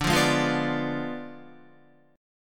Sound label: D Diminished 7th D down Guitar; strummed